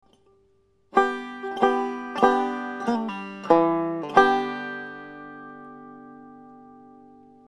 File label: ending